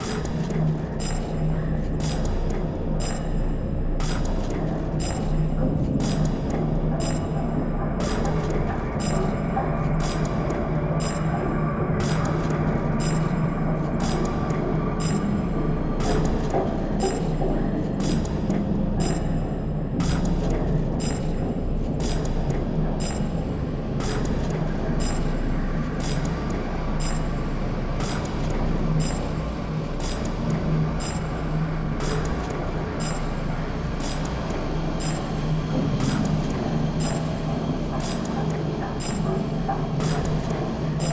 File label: Lonely Manor entrance Hall